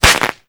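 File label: bullet cookoff, weapons impact; Call of Duty Modern Warfare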